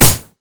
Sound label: blade surge; LoL; irelia